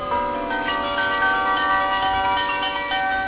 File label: carillons, bell; barneveld